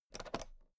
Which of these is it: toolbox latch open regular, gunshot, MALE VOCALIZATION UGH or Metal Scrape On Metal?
toolbox latch open regular